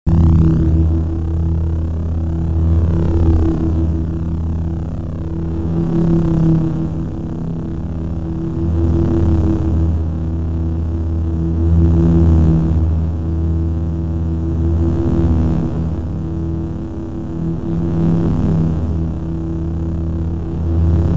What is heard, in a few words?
Saber Drone; FXHome